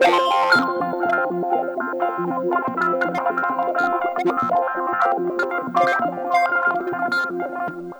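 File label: Guitars 120 F; Zero-G Kocktail Kollection; Adrennalinn 2fers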